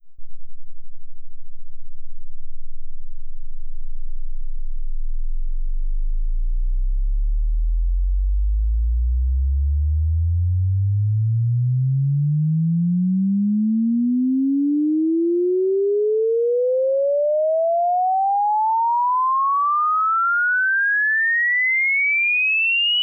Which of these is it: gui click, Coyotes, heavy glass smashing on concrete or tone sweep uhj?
tone sweep uhj